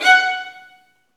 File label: STR VN, Roland, STR Vls Marc; Roland L-CD702; Vlns Marc1-3